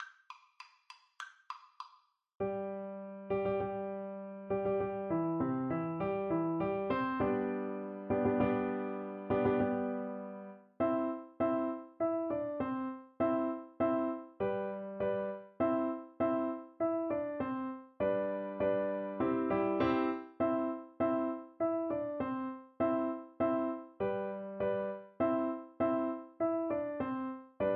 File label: rossini william tell, flute